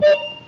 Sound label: Whistle; musical setups